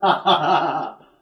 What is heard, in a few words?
laugh